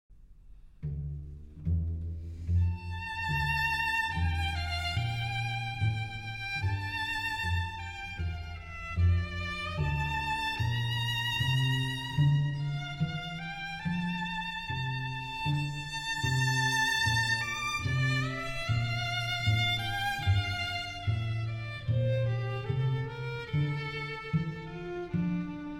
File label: Piano Quintet Intermezzo Lento